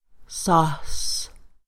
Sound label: Otter Sea; Dictionary